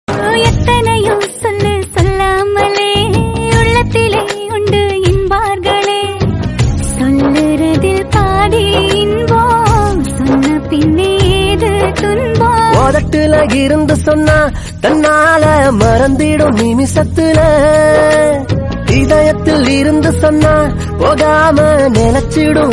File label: tamil top hit